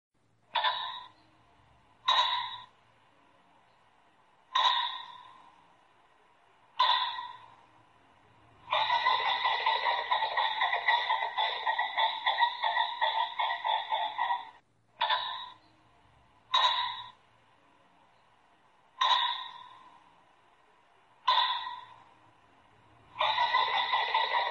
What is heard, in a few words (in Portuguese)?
canto do faisao comum